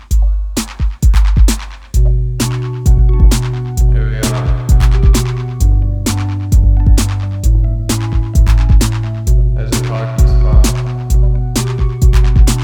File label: vocal mix